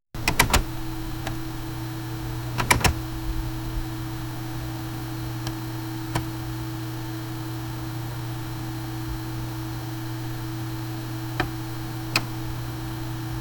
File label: sound of an old computer